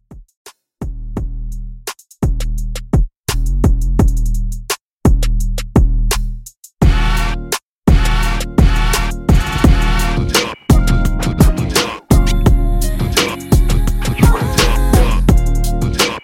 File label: Old School Redrum 85Bpm